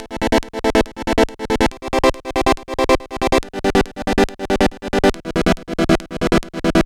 140 Chord Em, 140 BPM; loops; CHIPSHOP DELUXE

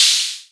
Vermona Hat; techno drum fx; Analogue DrumHits

